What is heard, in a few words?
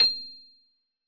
piano